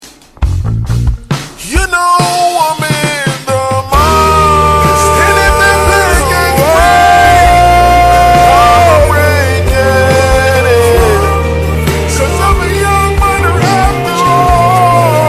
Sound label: slowed version